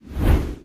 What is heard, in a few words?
skills effect; Minecraft; Votive 1.21.1; Votive Content